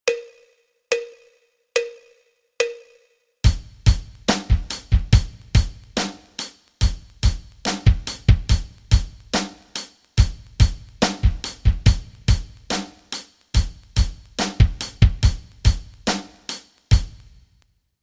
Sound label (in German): rock rhythmen